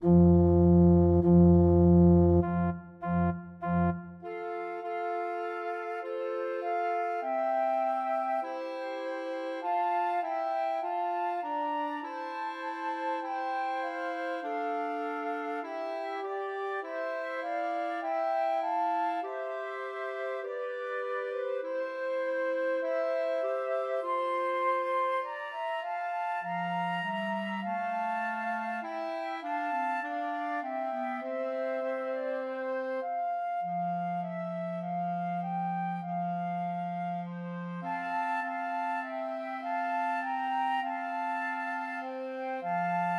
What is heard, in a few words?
Trios for Two Flutes and Clarinet; O Come All Ye Faithful; Christmas